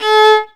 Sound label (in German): GEIGE; Best Service; Alpine Volksmusik